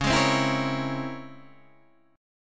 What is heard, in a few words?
C sharp Minor Major 13th, guitar chord